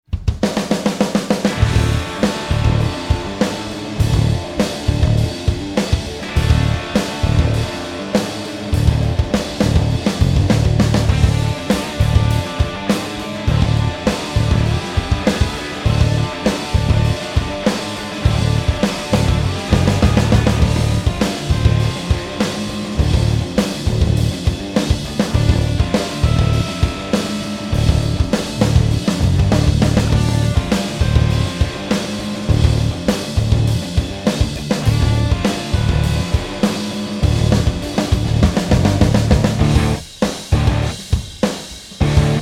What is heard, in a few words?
Modern Sludge Instrumental; PM USA